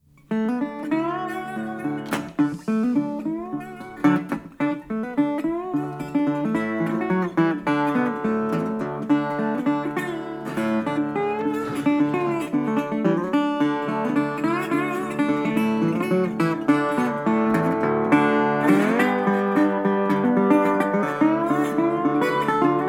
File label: Guitar Rag